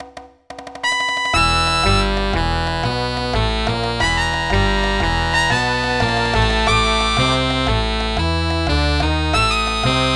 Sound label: intruder alert midi